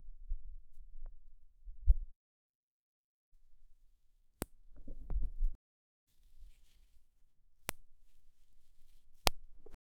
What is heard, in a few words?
someone picking a flower; flora